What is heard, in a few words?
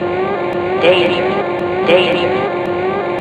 danger loop